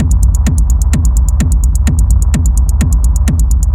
hard minimal sample kick; drum loops

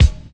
rich top end kick one shot f sharp key; kick drum

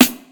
clear acoustic snare sample b key; snare drum samples

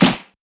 snappy snare drum sound g key

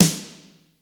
old school juicy steel snare drum f key

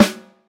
snare a key

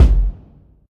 ambient steel kick drum sound f sharp key

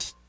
subtle hip hop hat sound B key; hat samples

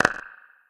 short reverb clap single hit b key; single hits one shots

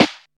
nice snare sound d sharp key; snare drum samples

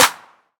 bright acoustic snare sample e key

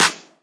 clap c key; percussion; single hits one shots

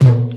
low floor tom single hit a sharp key; tom drum

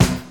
sharp snare drum F sharp key

